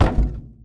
liquid barrel deploy; Rust

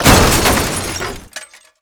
suburban crash impact; Call of Duty Modern Warfare; physics

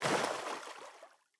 footstep water hard; Rust

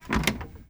foot wood creak, footsteps

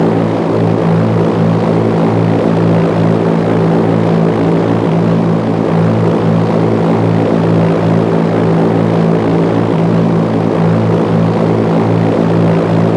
helicopter tail rotor mid; Rust

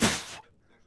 Blow Musket; Civ4

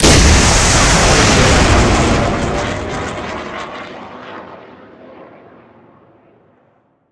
Weapons Missile Launch; DCS

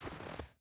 footstep rubber boots snow walk; Rust

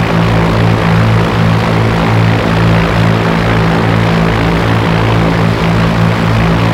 Aircrafts Engines UH1H Tail Rotor; DCS

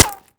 asphalt small

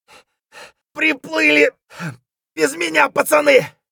hurt near death; Escape from Tarkov; scav4

